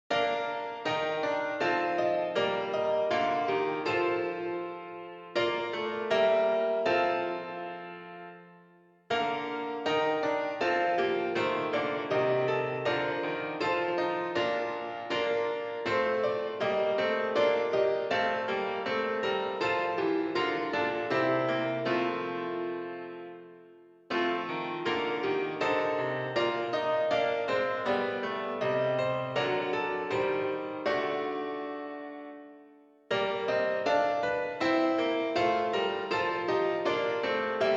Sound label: motet accomp